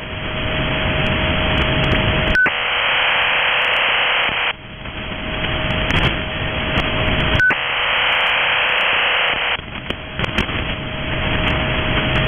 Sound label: ofdm; iarums